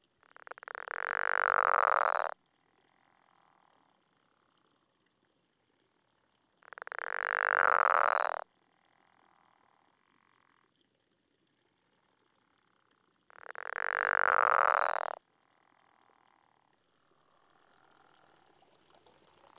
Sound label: Pickerel Frog